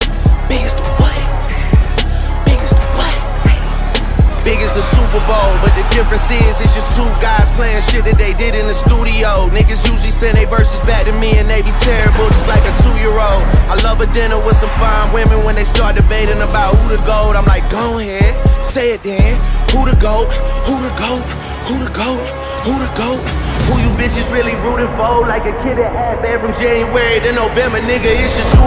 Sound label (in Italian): First Person Shooter suoneria